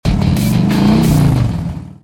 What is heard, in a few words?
motor; Wazdan